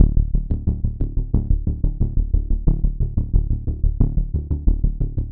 Bass Loop 90 Driving Pulse D; Ghosthack

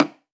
Percussion, Hip Hop, Drum One Shots

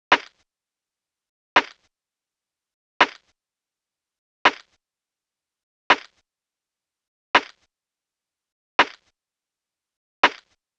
Crunch Drum Loop 83 BPM Rim, Lofi; Cymatics; ANALOG EVOLUTION Beta

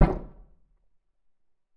Alien Weapon Shot; Game Weapons; Military; Game Essentials

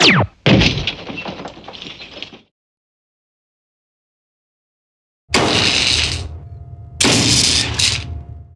lasergun; Cartoon - film effects

